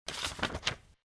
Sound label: auto turn